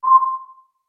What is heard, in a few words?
beep